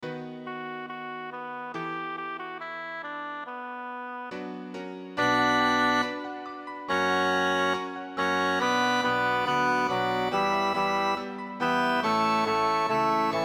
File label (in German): coro wir haben keinen koenig tenor; bach johann sebastian; johannes passion